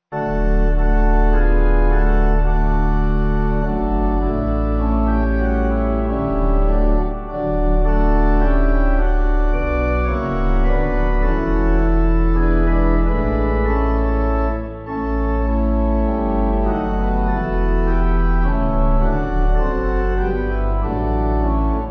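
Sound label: Pipe Organ, Music Backing Files for Churches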